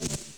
Erase Track

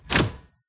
hand grab

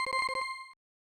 Item Chime; Clam; Flintstones The Treasure of Sierra Madrock; SNES

